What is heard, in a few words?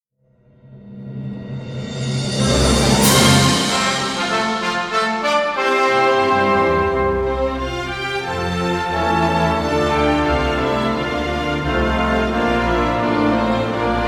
abridged instrumental; majulah singapura; national symbols